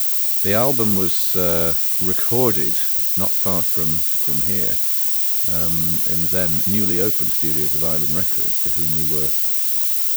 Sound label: usual dither 4 bit